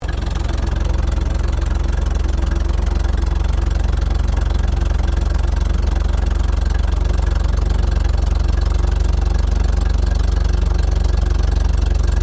Armored Car Daimler Ferret Idle Exhaust, British Ferret Scout Car; Truck Sound Effects; Trucks (Sound Effects:Vehicles)